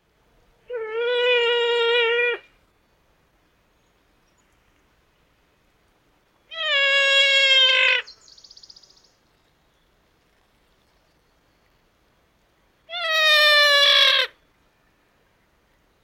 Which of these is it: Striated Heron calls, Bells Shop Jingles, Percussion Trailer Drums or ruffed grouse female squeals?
ruffed grouse female squeals